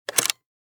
Technology Dv Camera Open Tape Deck Panasonic Dvx 1080 Camcorder